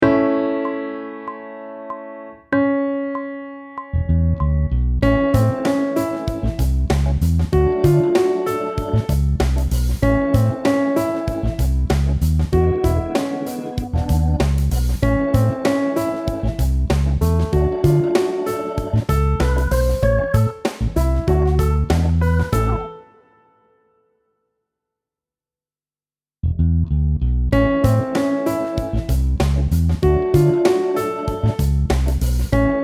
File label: aural tests harm of a melodic phrase; pop vocals; grade 7